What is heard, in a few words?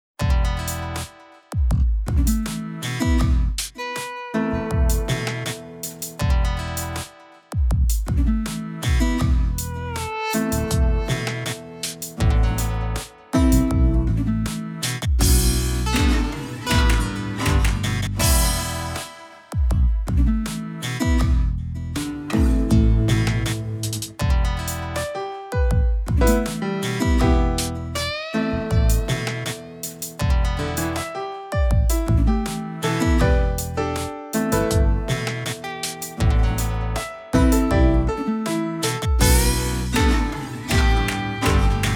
Open Strings